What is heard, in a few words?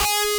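DISTORTED SYNTH G#4; AKAI S-Series CD-ROM Sound Library VOL-7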